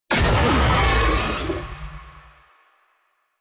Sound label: Infested Oni Vocal Pain; Warframe